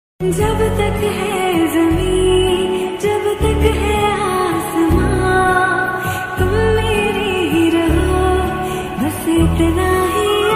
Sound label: Female Ringtone